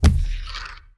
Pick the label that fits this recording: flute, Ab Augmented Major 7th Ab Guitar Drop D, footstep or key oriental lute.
footstep